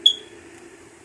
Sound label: smoke detector beeps